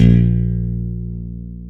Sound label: stick, Chapman Stick; Analog Odyssey